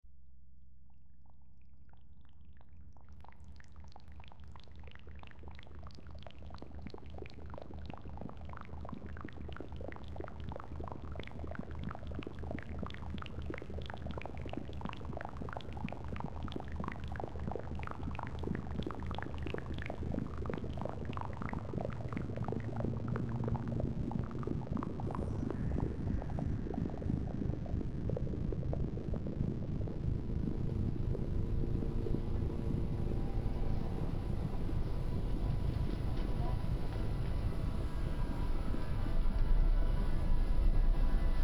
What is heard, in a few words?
metro creek